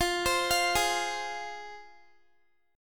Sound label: F Minor Guitar, chord; arpeggiated